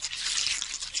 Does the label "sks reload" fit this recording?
No